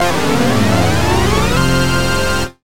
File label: Key